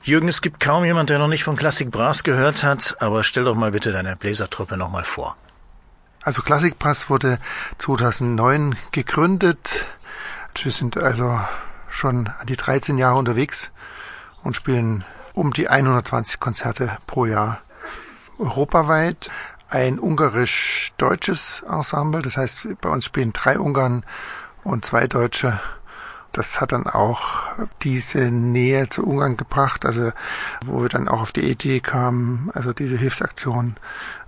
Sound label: classic brass